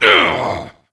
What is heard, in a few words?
Attack Medium; Creature; Varimathras